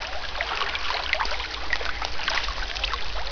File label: water medium